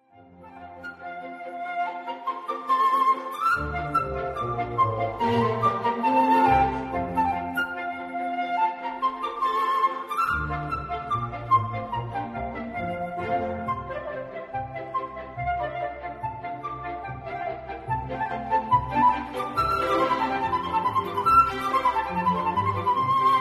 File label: Tambourin; The Magic Flute